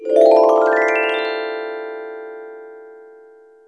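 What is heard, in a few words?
gliss, piano; AKAI S-Series CD-ROM Sound Library VOL-2; PF MIX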